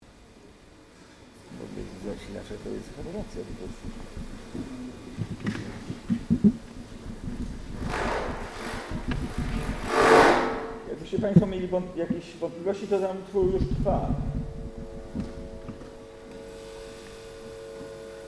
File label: Laptop Noise Ensemble